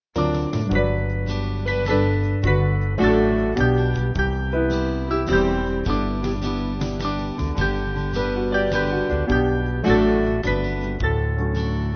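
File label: Band, Music Backing Files for Churches; Moore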